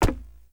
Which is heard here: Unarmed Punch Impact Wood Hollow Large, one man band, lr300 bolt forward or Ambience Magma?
Unarmed Punch Impact Wood Hollow Large